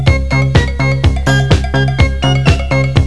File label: FUNKY